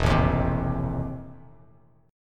E Major 7th Suspended 4th Sharp 5th, Bass Standard; EM7sus4#5 strummed